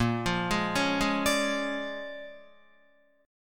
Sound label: Bb 7th Sharp 9th Bb Guitar, chord; 6,8,6,6,8,9; 4E,4A,5D,5G,5C,6F; Bb7#9 arpeggiated; JGuitar